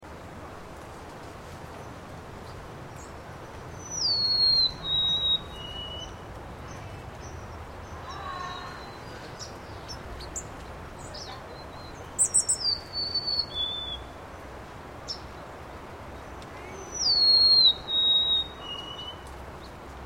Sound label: Golden-crowned, bird songs; spring